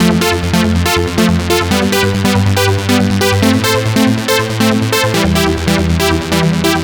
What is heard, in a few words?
NRG Arp, trance